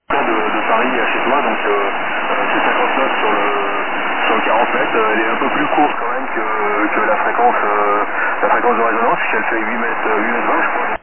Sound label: test roofing filter